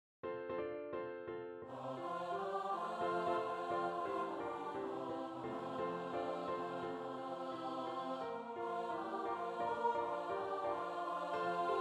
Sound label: Cowboy carol ALT